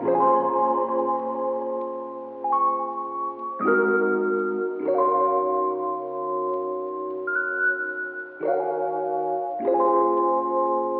keys, sad loops

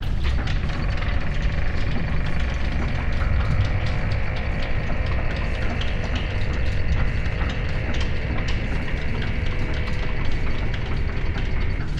clock tower; Rumbles

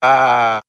"ah"

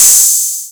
Hats Electro Open

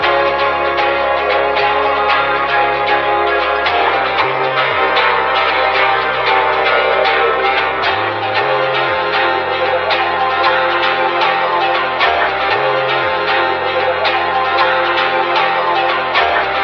guitar heated

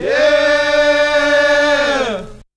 yeah SUNG

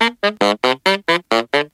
sax, Phonky loops

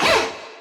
zap

